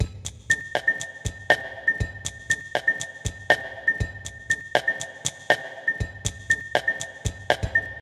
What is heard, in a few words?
Beat